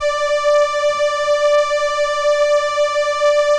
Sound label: ELKA, String Machines; ELKA RHAP